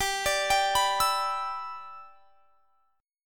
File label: G 6th; G6 arpeggiated; x,22,24,24,24,24